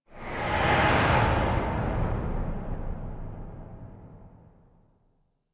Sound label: Ship By; Warframe; The New War; Zariman; Apartment